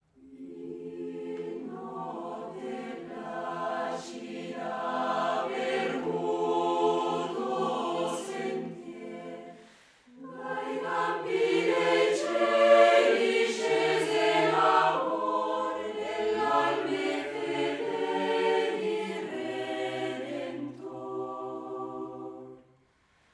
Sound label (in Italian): Tutti; In notte placida